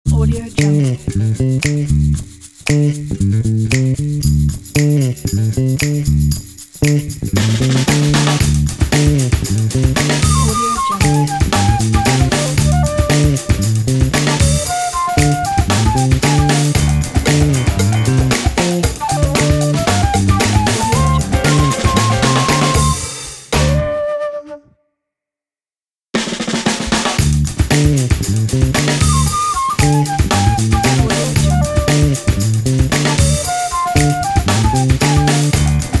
funky bass logo

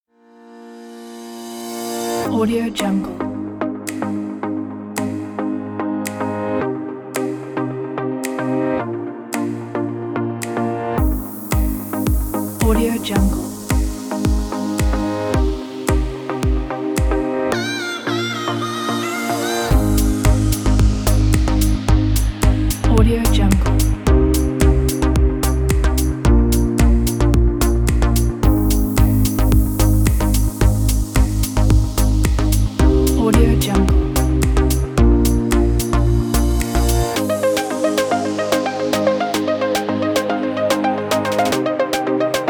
summer lounge

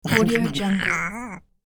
gross gremlin

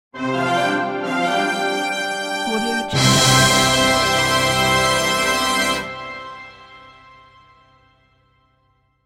win fanfare

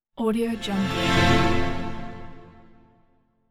symphonic string warning calls; hollywoodedge

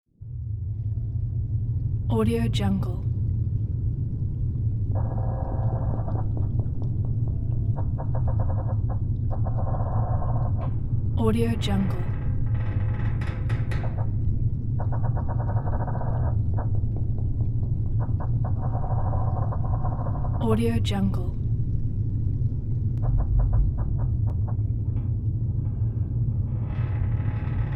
submarine ambience

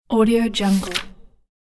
turning page

